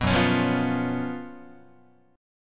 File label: F sharp Major 7th Suspended 4th, Guitar; F#M7sus4 Chord; strummed; 2,2,4,3,3,4